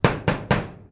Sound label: Hitting Locker